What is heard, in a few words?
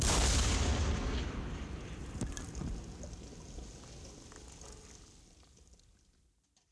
mortar hit, weapons; dod 1.3; dod enhancement pack